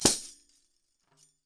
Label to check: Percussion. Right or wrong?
Right